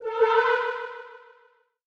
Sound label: ACCENT Creature, 156 BPM B Min; Ear Candy; Cymatics; ANALOG EVOLUTION Beta